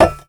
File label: weapon drop common